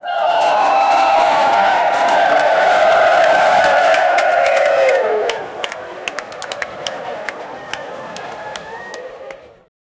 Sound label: millions of people in a, whistle